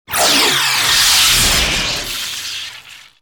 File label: shield sizzle